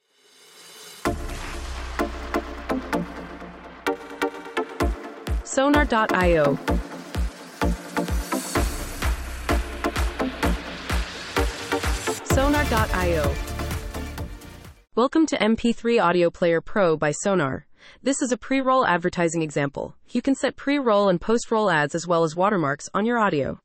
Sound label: House Party Ident